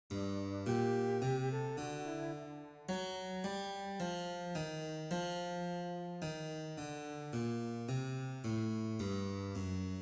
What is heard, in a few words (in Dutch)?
Karaoke g Allegro; solfege eerstejaars zingen ritme